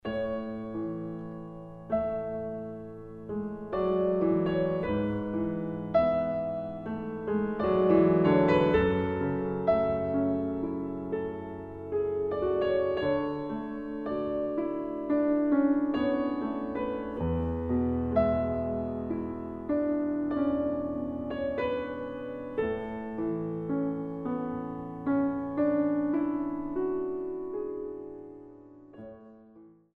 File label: Ballade